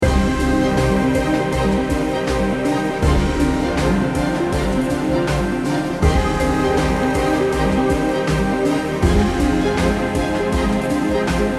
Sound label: battle music; gamedev market cinematics battle music compilation pack